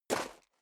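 step high heels gravel medium single right, footsteps; schritte; menschen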